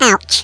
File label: fall; quake2; karrot